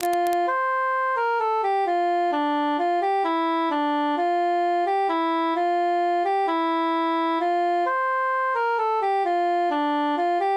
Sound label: altus solo; au joly jeu